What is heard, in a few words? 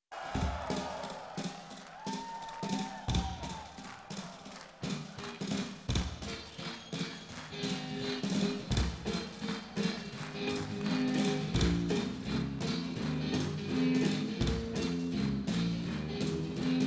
Rock